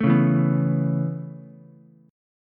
B 7th Suspended 2nd Suspended 4th down Ukulele, chord; B7sus2sus4; strummed; JGuitar